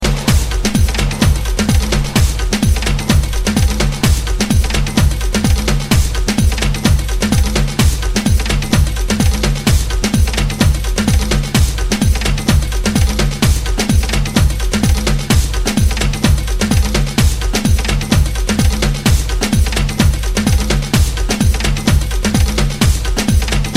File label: drums beat